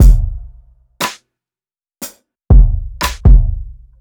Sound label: Puffy 60, drums; Basement drums vol 2